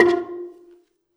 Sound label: Gambang Soft Beater, Gamelan